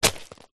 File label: footstep single boot deep small granite stones dirt dust; zapsplat; foley